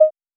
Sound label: warning; KitchenChaos